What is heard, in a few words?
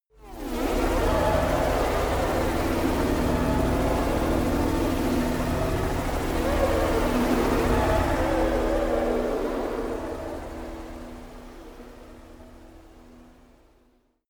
space unknown lively world; atmosphaeren umwelt; weltraum